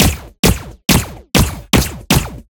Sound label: AR55 gunshot